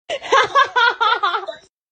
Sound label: laughs